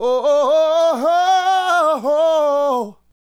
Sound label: Adlib, Hip-Hop and RnB Vocals